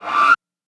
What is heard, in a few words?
horror ghost scream